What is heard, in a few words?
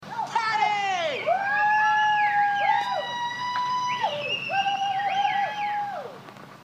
party wooo